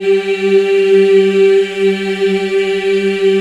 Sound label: G3 MW HI, choir; USB Soundscan vol.28; Acoustic & Synth; AKAI